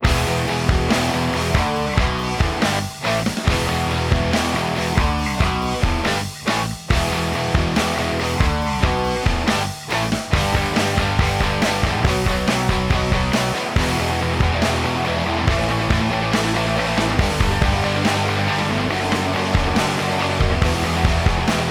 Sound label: Guitars Timed